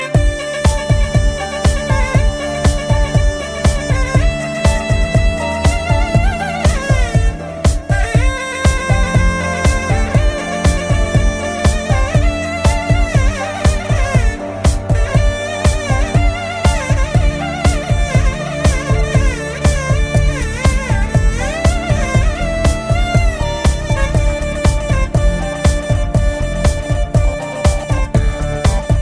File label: Modern Nadaswar